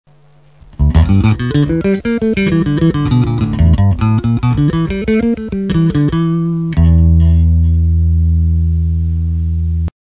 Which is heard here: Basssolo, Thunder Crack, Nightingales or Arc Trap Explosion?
Basssolo